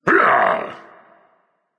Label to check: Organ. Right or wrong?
Wrong